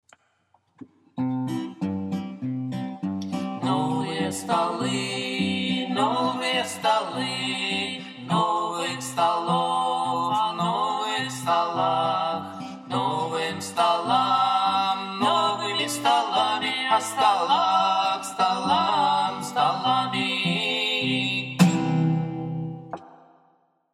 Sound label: Song, Russian Songs